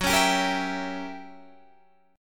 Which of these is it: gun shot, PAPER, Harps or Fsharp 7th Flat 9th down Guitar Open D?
Fsharp 7th Flat 9th down Guitar Open D